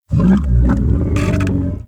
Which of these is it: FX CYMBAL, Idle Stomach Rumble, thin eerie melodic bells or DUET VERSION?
Idle Stomach Rumble